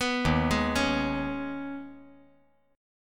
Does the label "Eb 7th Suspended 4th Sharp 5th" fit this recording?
Yes